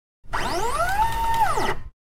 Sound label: Servo Motor Quick On & Off of a Servo Motor; Electronic Sci-fi